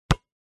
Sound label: sport basket ball hard bounce x1 on asphalt concrete